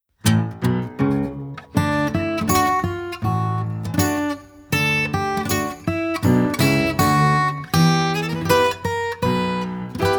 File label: Slow to Fast Circle Race Instrumental